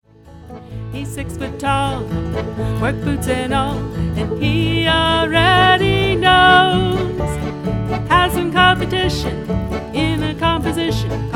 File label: Polka on the Banjo